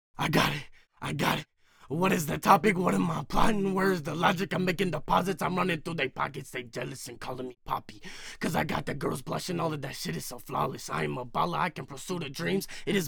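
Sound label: hip hop 136 Am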